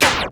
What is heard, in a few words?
glitch